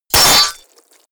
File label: sword impact gore long